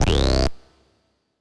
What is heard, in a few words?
error